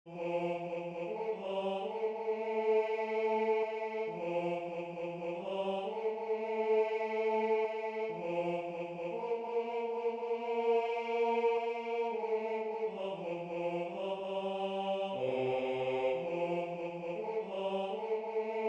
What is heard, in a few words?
This little light of mine SATB